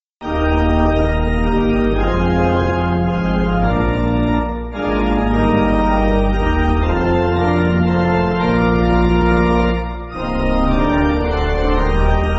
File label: Pipe, church music